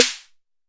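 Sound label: TR808 Snare, Roland SH-32 Drumset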